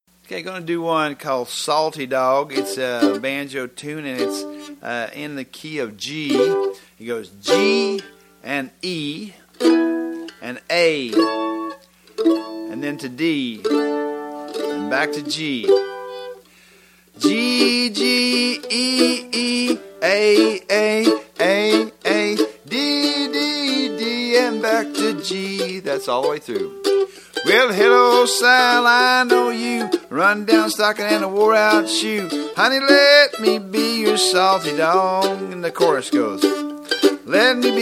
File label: Salty Dog (G)